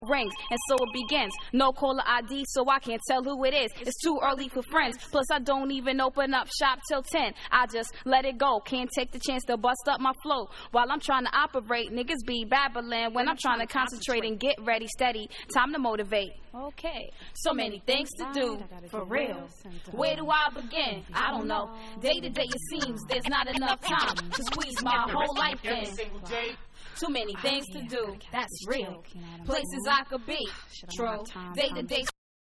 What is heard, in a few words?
Acappella